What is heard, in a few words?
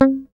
GUI MUTE, E-GUI MUTED